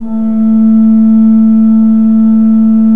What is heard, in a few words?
A2, Cathedral Organ; ILIO; Propeller Islands